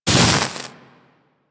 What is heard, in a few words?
Axe Projectile Impact D; Sundial